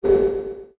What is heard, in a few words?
Destroyer Run Loop; Sid Meier's Civilization 4 Complete; Beyond the Sword; Final Frontier; Units